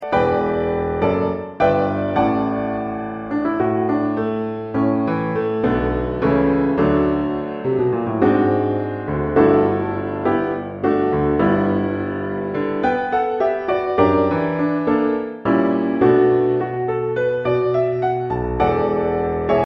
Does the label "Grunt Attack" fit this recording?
No